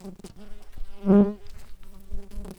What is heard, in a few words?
Pascuorum workers, bumblebees